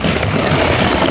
slide wood, terrains; Tony Hawk's Project 8; XBOX

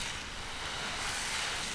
loose snow; Quantum Redshift

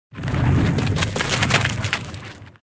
debris fall; weapons; Soldier Of Fortune II Double Helix; XBOX; explosions

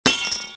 casing grenade; bullet impacts; Soldier Of Fortune II Double Helix; XBOX

